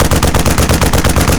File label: 50cal loop; WAR